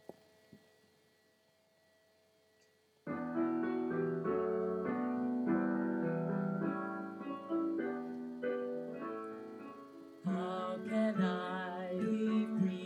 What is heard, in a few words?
soprano